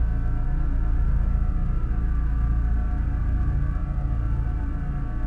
ambience hum; Star Wars Obi Wan; XBOX

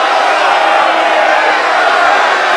Boo loop, Crowd; David Beckham Soccer; XBOX

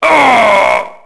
get hit wounded; characters

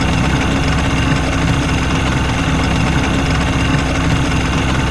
Boat Idle Engine Loop; Vehicles

